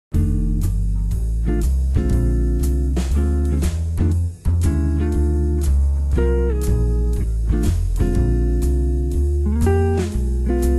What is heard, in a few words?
blues f backing